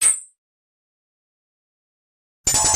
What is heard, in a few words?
Thin Piercing Zap